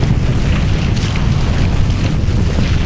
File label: Quake; Muzyka i dźwięki z gry